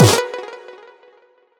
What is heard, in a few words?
combo power; quatuor